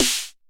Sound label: TR909 Snr, drum machines; Roland SH-32; Drumset 04